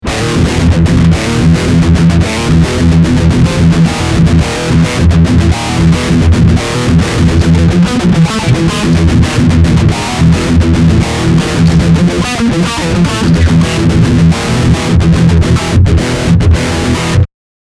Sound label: Poweramp Out